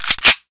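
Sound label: garand bolt forward; weapons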